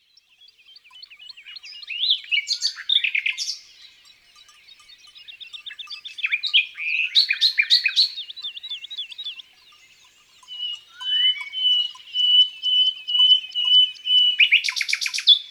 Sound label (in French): Rossignol philomele, Chants d oiseaux dans la campagne